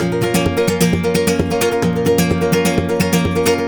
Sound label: 130, 130RUMBA2, Spanish And Gypsy Traditions; Soundscan vol.59; AKAI